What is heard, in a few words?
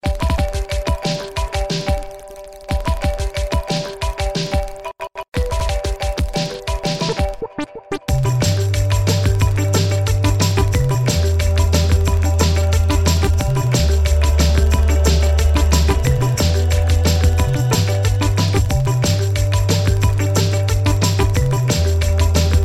iphone ring; ringtones